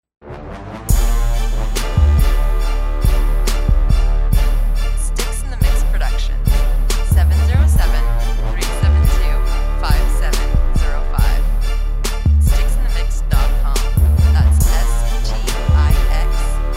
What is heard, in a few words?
Bear Trap